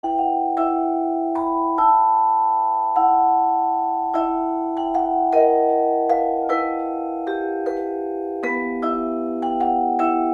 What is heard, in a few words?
Marimba Vibraphone; tono de llamada; Harry Potter